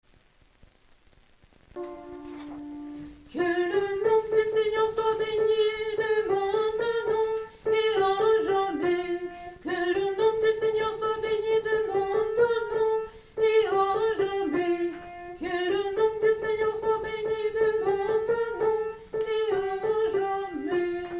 Que le Nom du Seigneur soprano

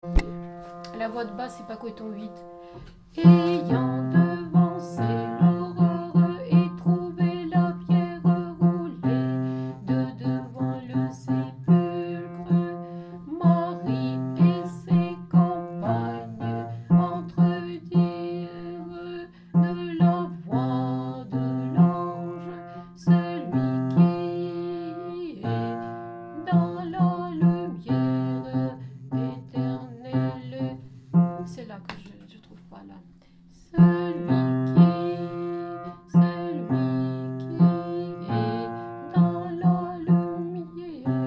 Ode Hirmos hypakoi basse

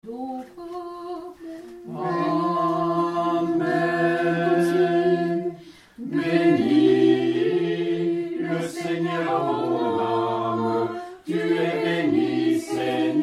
Antienne ensemble

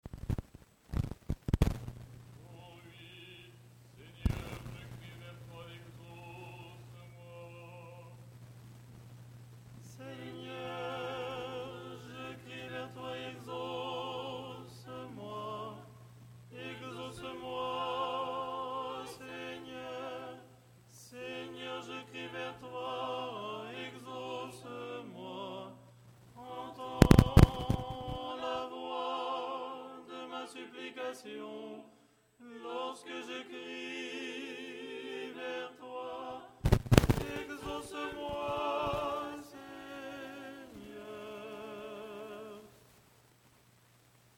Ton 8 stichere lucernaire tenor

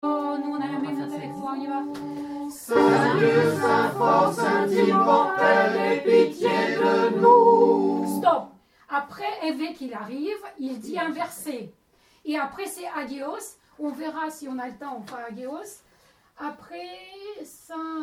Saint Dieu recitatif ensemble

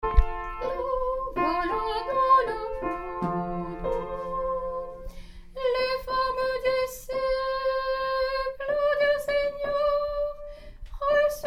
Tropaire ton4 Tenor; Voix ténor

